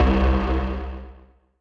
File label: Tube Lift Bass; Ableton; Factory Packs; Punch and Tilt